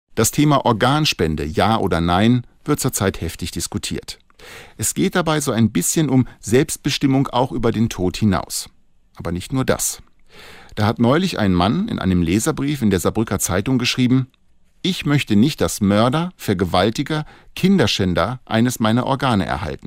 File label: Organ